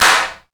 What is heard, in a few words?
clap single hit b key; percussion single hits one shots